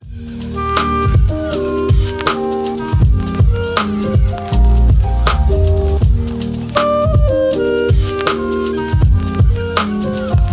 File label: Cozy Jazz